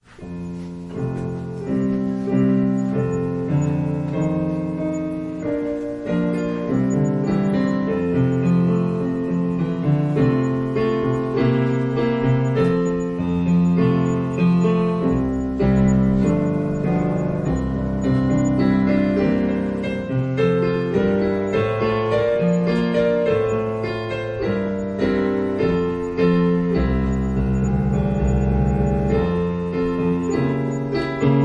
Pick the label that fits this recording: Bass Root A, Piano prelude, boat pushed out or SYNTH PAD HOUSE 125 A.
Piano prelude